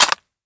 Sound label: mp5 slide forward; weapons; Counter Strike Model Pack; CS:GO